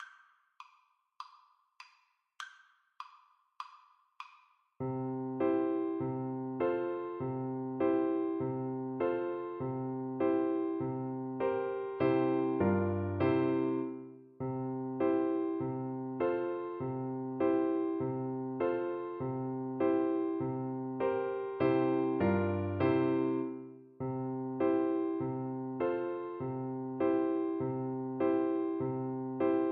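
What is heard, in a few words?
recorder karaoke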